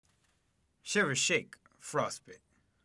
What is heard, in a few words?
shiver shake frostbit